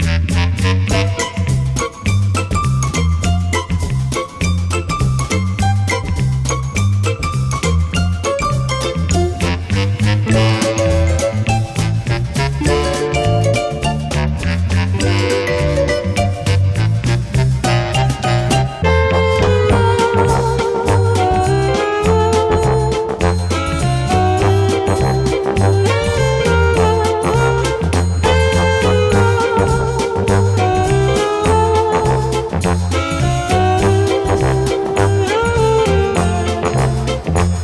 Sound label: theme; Skylanders; Shield Shredder